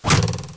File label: Arrow stick